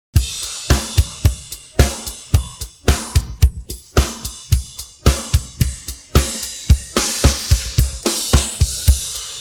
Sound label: pattern, drums